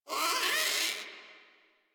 Forest Rodent Vocal Angry Short; Characters; Warframe

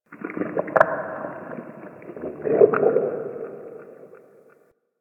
Digest Interior Medium, Ambience

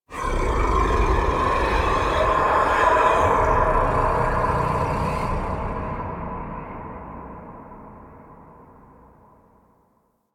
Sky Breaths, Ambience; Warframe; Duviri

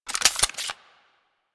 Uzi Pistol Reload, weapons; Grn Uzi Pistol

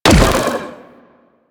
Swarm Missile Fire; DE; Warframe; Weapons; Sentinel; Helstrum

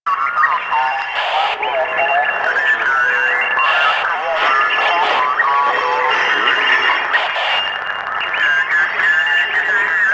Military Backpack Loop; Objects; DE; Warframe; Storage Container

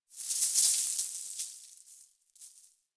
Mountain Pass Snow Hit Player; Ambience; Warframe; The War Within

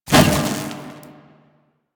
Whoosh; Warframe; Quests; The New War; Drifter

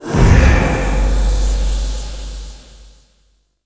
Survival Corrupted Aura Inner Despawn; Warframe; Zariman

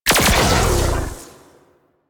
Shot B; Warframe; Weapons; Corpus; Vandal

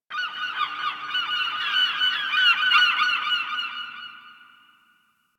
Bird Seagull; Warframe; Ambience; Eidolon Plains; One Offs

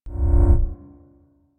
UI Zoom Out; DE; Warframe

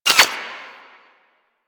Necramech Repair Drone Idle Short; Enemies; Warframe

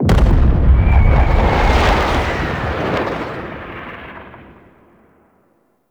distant cannon fire; Makvoss; Warframe; Tau

